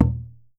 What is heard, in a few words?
OKE-DO, JAPAN PERC; AKAI S6000 CD-ROM; WorldSounds